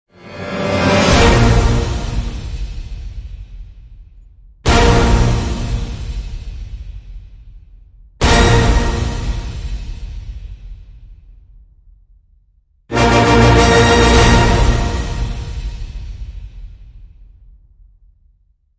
Orchestral End Hits (A#); Epic Tools vol. 1

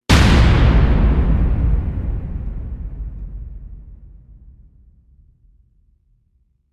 Bridge Hit Epic; Ninja Tracks

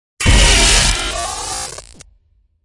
Impacts Demolition; Gothic Storm Music; Advanced Sound Design

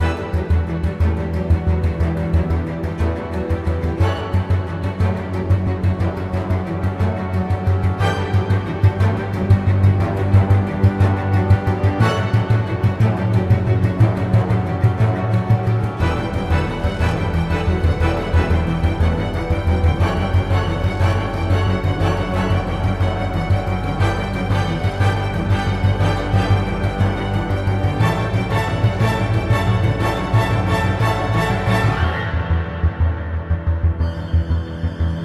Rage Ambient

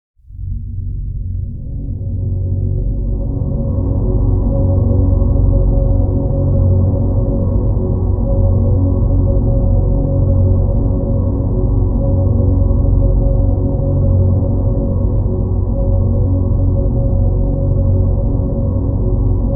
Hovverdrone; Fired Earth Music

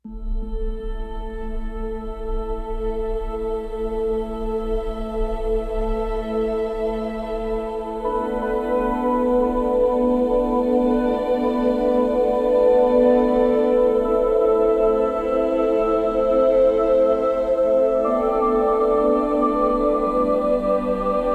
Ambient

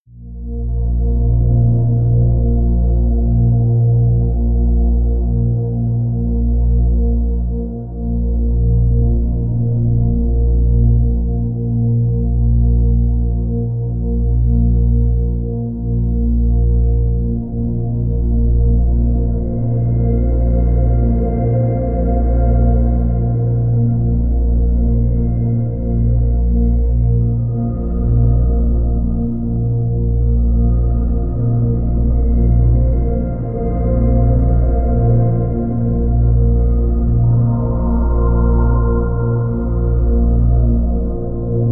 Drn Purity (Bb); Brand X Music; Brand X Music Catalogue - Editor's Essentials

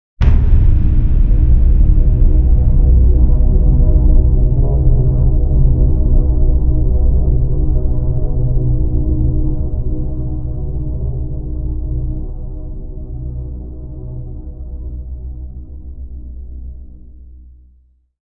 Boom Drone; Epic Tools vol. 1

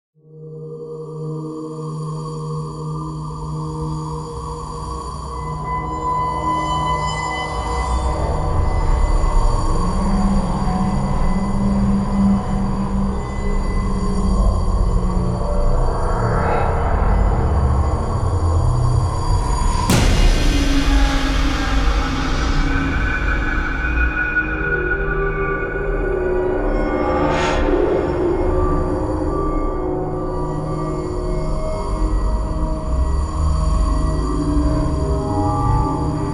Disturbing Dissonance; Gothic Storm Music; Brain Warp (Sound Design Trailer Tools) 2011

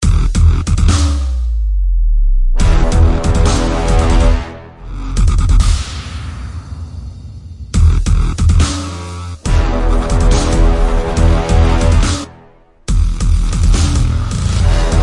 Dog Step Drops

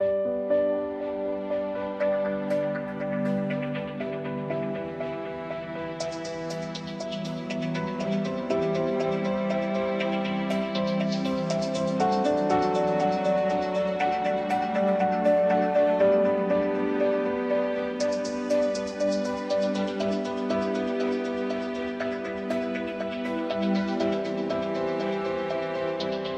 Gorgeous Ambient

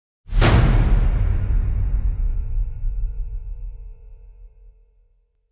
Battle Whoosh; Gothic Storm Music

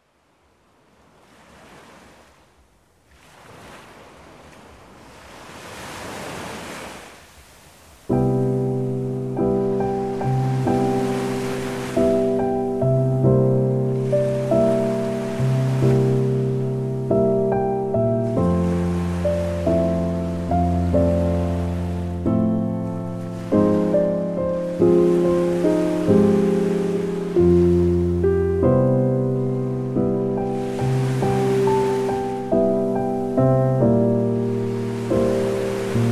Piano Moonlight Beach Waves

